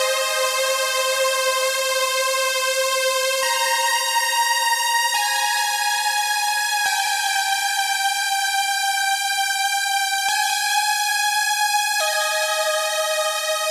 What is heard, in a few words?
Lead sound; Пэд сэмпл Техно-Транс; Techno Trance; 140BPM; Пэды; pad